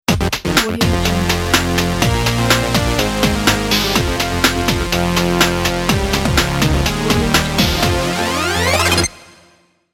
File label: retro game intro ident; groovinggecko